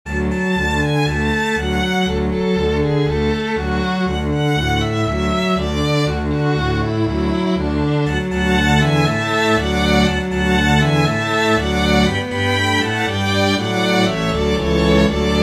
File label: dueling violin